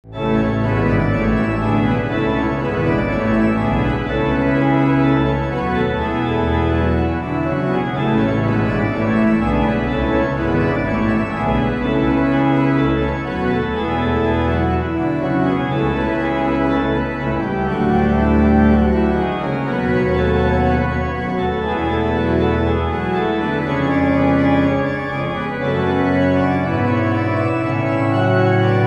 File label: organ; bach; akg ck62; microphone test with organ